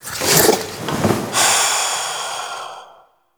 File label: Slumps Dies; Warframe; Entrati Labs; Cavia Rank Up Scenes; Tagfer